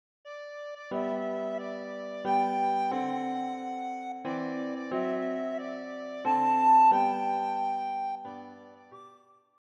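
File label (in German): Happy birthday to you, Sopranblockflöte; Geburtstagslied für Sopranblockflöte und Klavier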